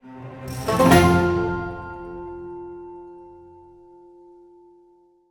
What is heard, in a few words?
stinger, Chest Opened; LEGO Horizon Adventures